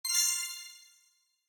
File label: select buttons; super color lines